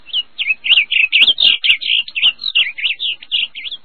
bird blue; minecraft; technic

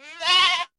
goat kid; minecraft; technic

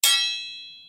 turtle hurt; minecraft; technic

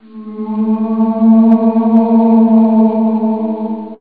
wraith; minecraft; technic

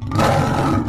lion hurt; minecraft; technic

